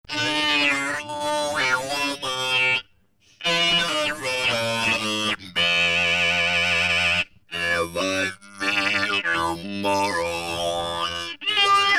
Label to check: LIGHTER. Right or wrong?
Wrong